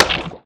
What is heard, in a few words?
axe mining; Factorio